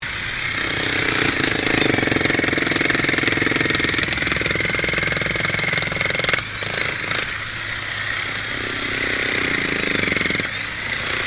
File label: JACKHAMMER BOSCH ELECTRIC DIGGING BITUMEN STEREO, Construction Bosch jackhammer